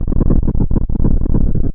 Quake Stereo; LTTP

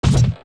Fall Down Bridge; Link

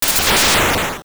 Lightning; Oracle

